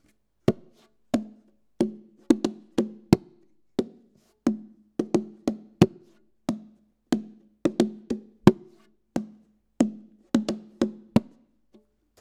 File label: Bongo Raw